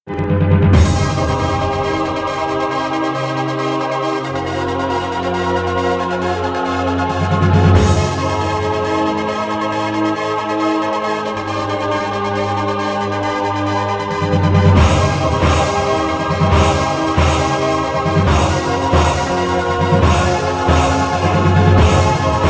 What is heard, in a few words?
Epic Trance